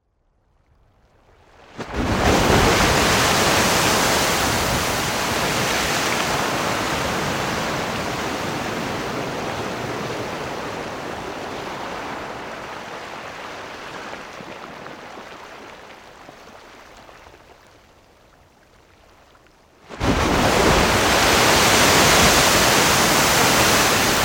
Underground Water Geyser Three Spaced Bursts

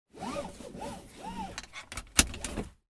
Auto Seatbelt Compact Interior

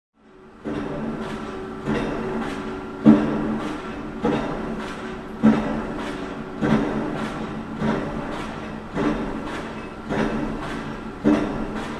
CONSTRUCTION AMBIENCE PILE DRIVER

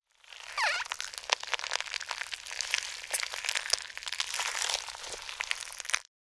Egg Hatch Big And Gooey

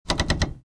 TELEVISION VINTAGE

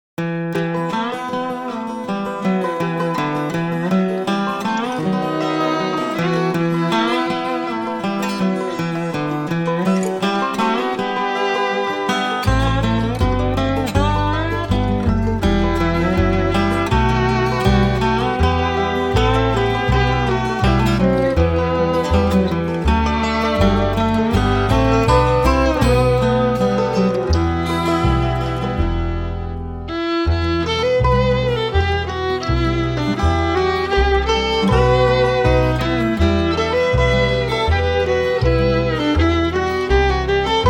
God Rest Ye Merry Gentlemen

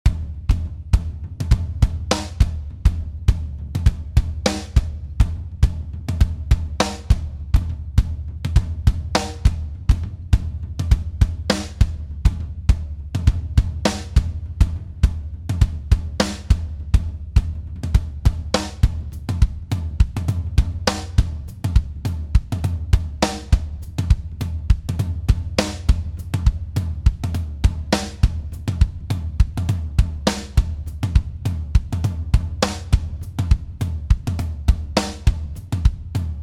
Acoustic Drums

